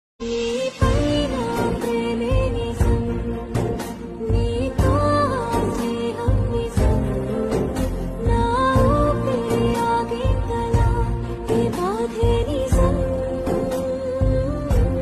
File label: Female Version Ringtone, telugu